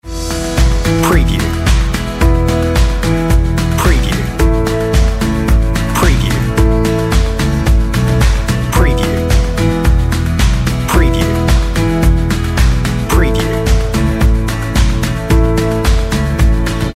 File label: On Hold Music